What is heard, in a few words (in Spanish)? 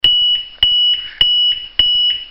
SONOLAMP 59 INTERMITENTE; SERIE 59